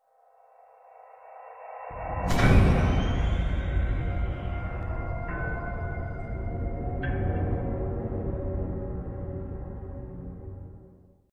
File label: minecraft cave sound